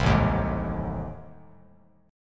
A Minor Major 7th Flat 5th, Bass Standard; strummed; AmM7b5; 8,0,1,1; JGuitar

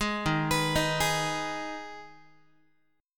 Eb Suspended 4th Sharp 5th, Guitar; Ebsus4#5 arpeggiated; JGuitar

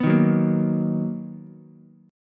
B Major 7th B down Ukulele; strummed; JGuitar

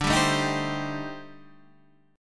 A-Minor 7th Flat 5th D, chord; Am7b5/D strummed; JGuitar

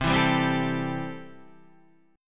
C 6th C, chord

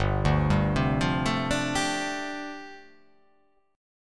G 7th Suspended 2nd Suspended 4th

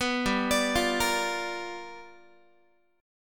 G 7th Sharp 9th G chord, Guitar; G7#9 arpeggiated

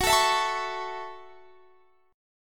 Gb Minor 7th, Guitar Standard; strummed; JGuitar

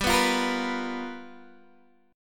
G 7th Sharp 9th, Guitar Standard; G7#9 strummed

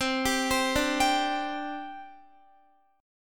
C Suspended 2nd C Guitar; arpeggiated; Csus2; 4E,4A,5D,5G,5C,6F

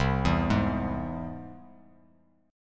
C Augmented down; arpeggiated; JGuitar

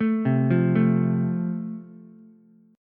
F Major, Ukulele; arpeggiated; chord

